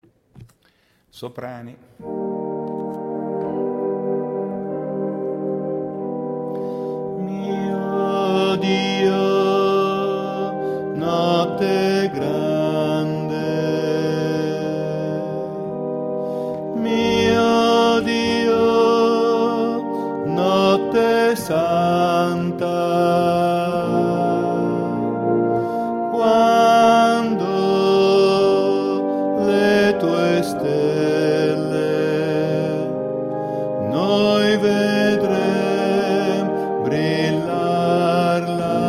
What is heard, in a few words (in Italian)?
Soprani Tutti; Coro; Natale; Mio Dio notte grande